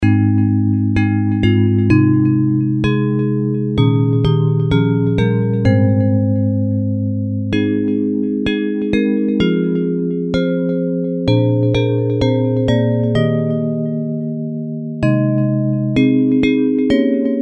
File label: Bells